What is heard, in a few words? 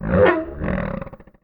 MONSTER Growl Hurt; MONSTERS & CREATURES; mono; Universal Sound FX